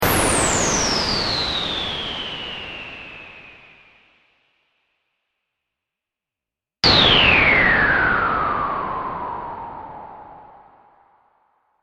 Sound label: Space Missile, 2 x futuristic space missile shots.; Battle